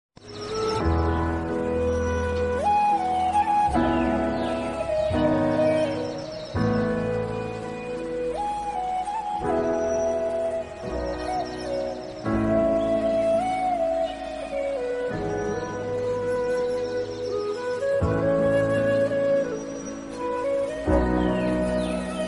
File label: flute instrumental; tamasha movie